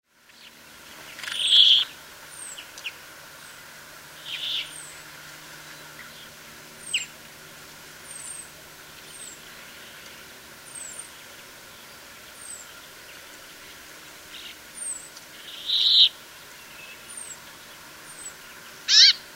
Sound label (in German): bergfink